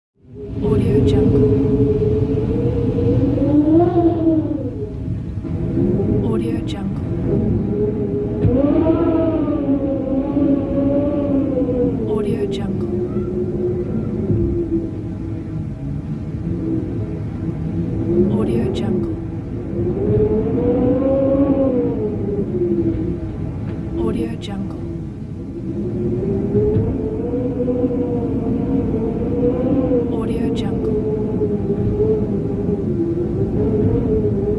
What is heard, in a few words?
blizzard